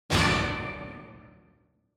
Quiz Question Mid; クイズ; 出題

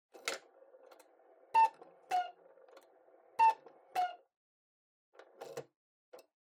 Cuckoo Clock Short

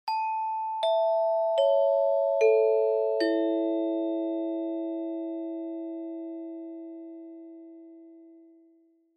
Chime Announce 5 Tone Slow Down

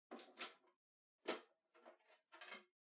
Portable Stereo Far CD Open Take Out

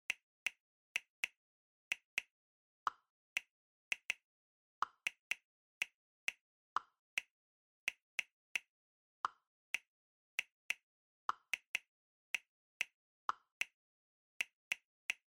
Smartphone Typing Slow

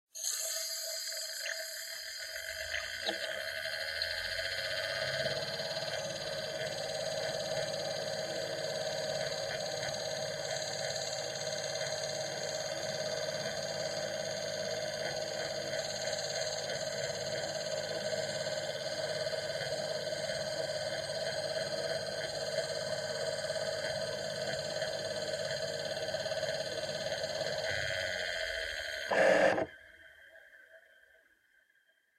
Portable CD Driving Noise Play Stop

